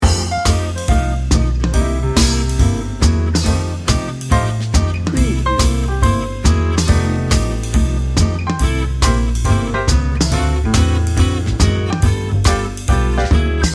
big band loop

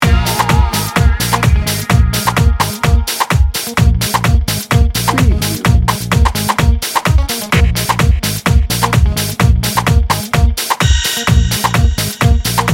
funky fantastic

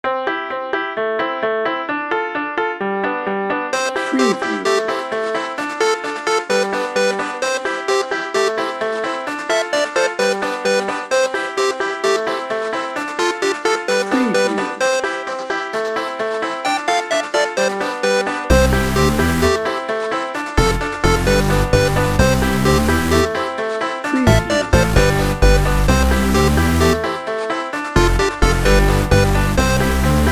beach jam no drums

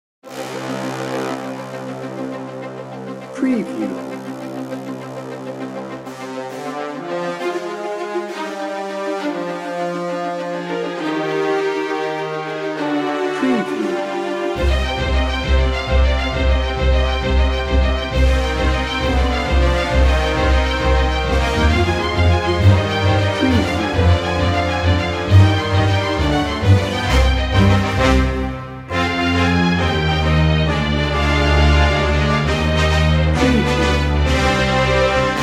bed no drums

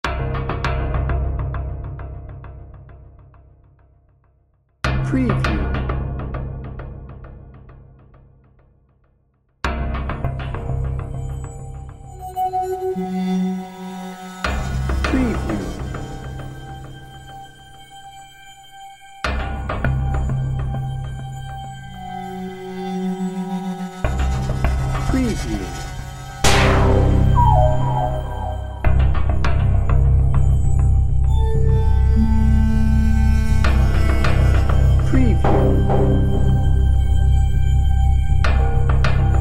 evil intention bed no drums

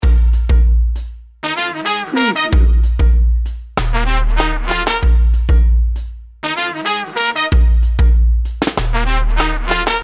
swing town loop

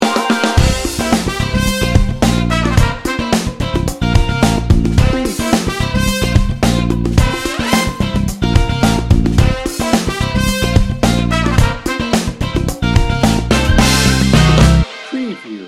funky feet

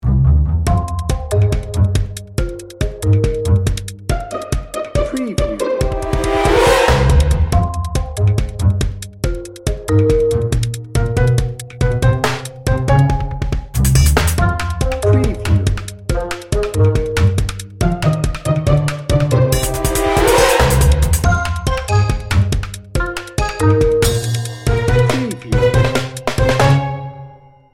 busy pluckers

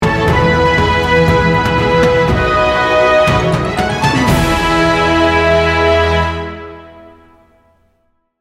inspiring hopeful cinematic sting bumper

